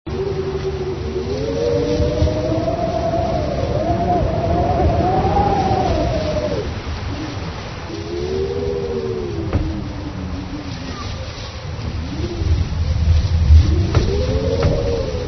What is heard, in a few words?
wind and rain